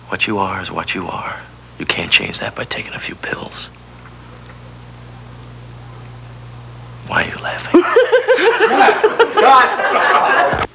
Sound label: laughing